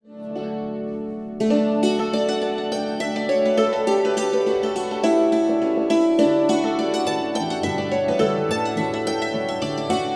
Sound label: Liverpool Hornpipe